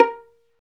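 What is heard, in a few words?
STR Violin Pizzicato, Solo Violin FX; Roland L-CD702; Solo Vln FX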